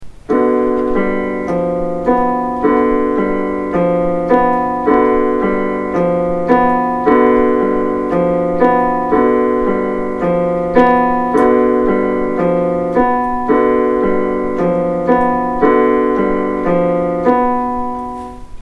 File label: Ostinato; Purity